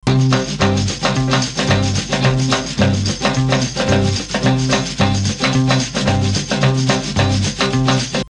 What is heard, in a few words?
samba